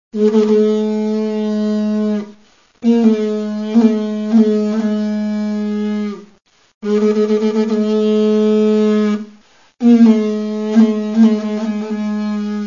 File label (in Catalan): trompa